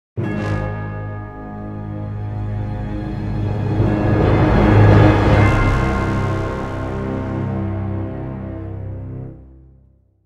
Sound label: Defeat Jingle